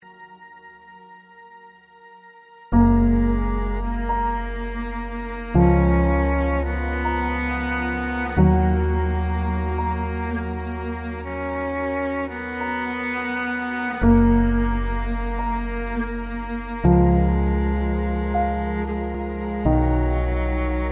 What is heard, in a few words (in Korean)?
묵념 음악